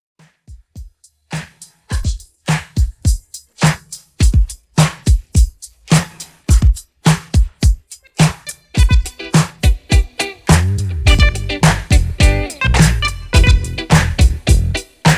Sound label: Re drum